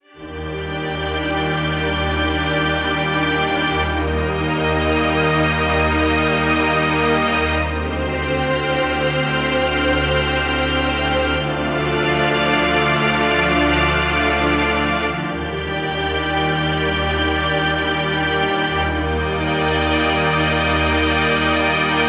String Orchestra Loop Cinematic Movie Soundtrack

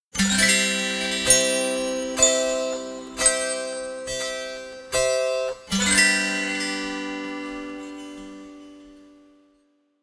Fairy Logo

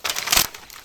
Branch Break

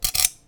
Kitchen

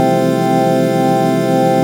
Major Chord

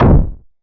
Locked Door

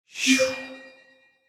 Ui Completed Status Alert Notification

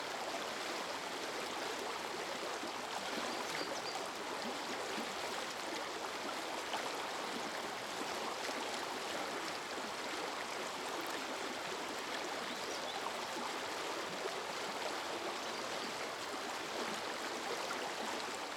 River

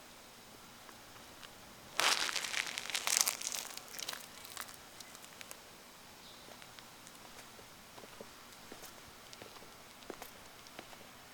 Blood Guts Sweetner

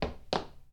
Stumble Steps

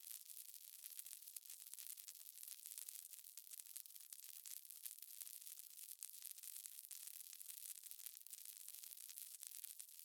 Subtle Static Crackling Texture

